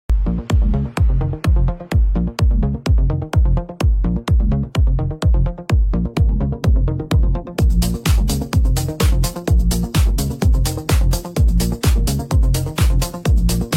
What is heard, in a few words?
Techno